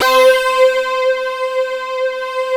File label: ARP, Analog Fantasies; USB Soundscan vol.49; AKAI